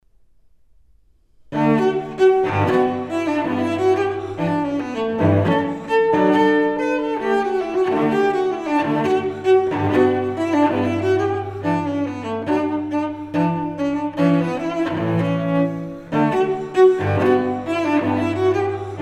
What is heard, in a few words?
Suite for solo cello No. 6 in D m; Bach; Cello Suites